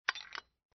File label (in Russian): zaryad patrona v revolver